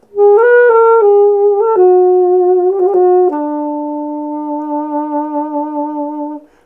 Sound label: alto sax melody